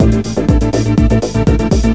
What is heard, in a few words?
D-Kit